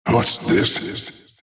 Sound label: juggernaut death